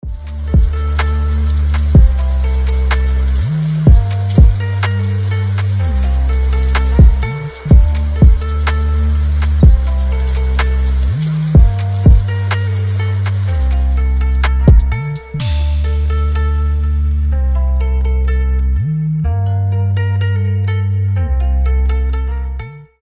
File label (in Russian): биты